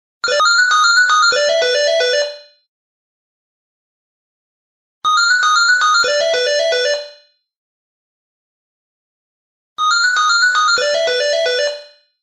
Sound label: 3d ringtone